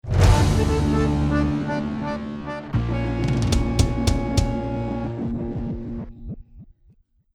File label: lost round